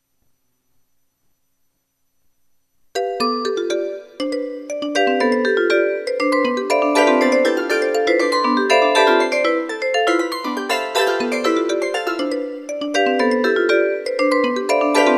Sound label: Steel